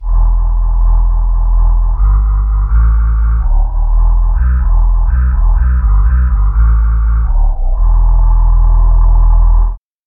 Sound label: space voice bass